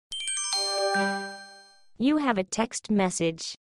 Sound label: hit text message ringtone